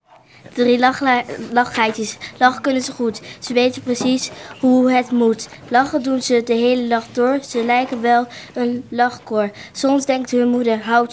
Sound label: rap